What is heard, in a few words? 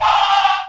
"ah" distant, Voice; ooh ahh